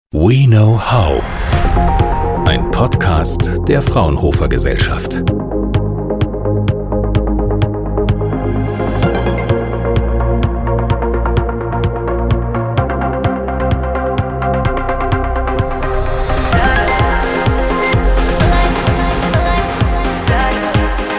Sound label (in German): Opener und Musikbett fast; WeKnowHow